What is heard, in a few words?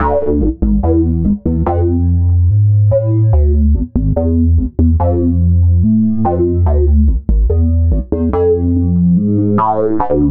Synth Bass